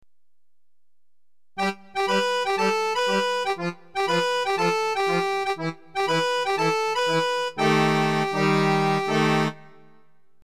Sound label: Jingle